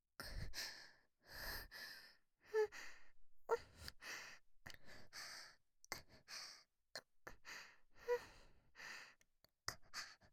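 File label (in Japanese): 喘ぎ声リピート控えめVer(左右反転版)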